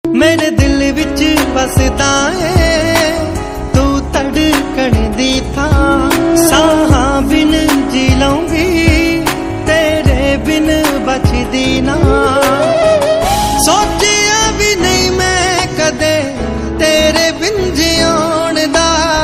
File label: Ringtone